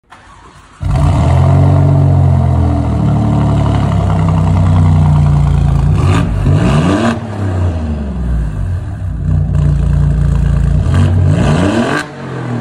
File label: Alfa Romeo exhaust